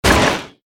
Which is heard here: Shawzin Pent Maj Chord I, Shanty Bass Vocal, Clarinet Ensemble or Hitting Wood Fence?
Hitting Wood Fence